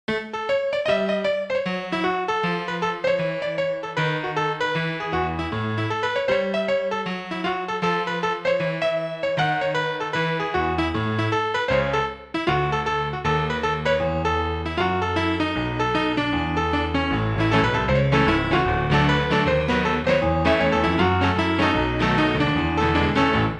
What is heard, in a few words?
ragtime